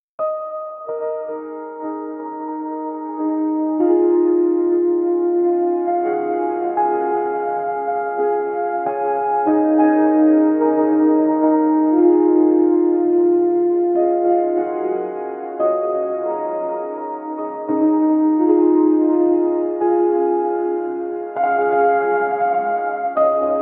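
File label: emotional lo fi instrumental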